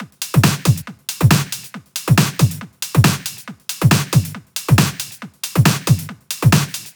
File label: Kit 4, 138BPM; Myst Kit